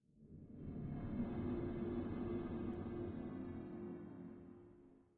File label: Nondiagetic Ambience